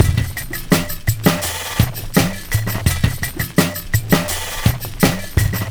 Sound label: 84 bpm, breaks; Zero-G Beneath the Planet of the Breaks